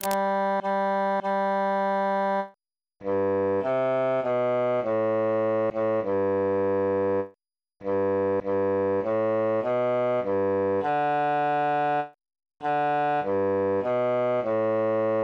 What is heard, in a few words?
bassus solo; come again